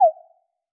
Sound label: In Correct